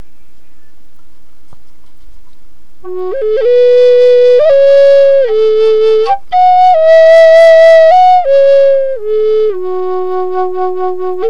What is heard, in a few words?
F sharp 1 bore Drone, flutes